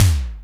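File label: Ol' Hex, Alesis D4 drum machine tom; 300 Drum Machines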